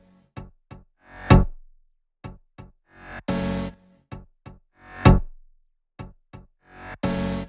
Melo 128BPM; Mini Kits

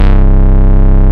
Ultimate Bass Root C; Vengeance Samples

